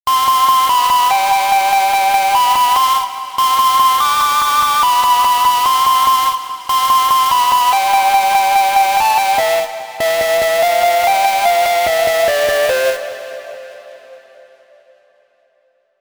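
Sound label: melody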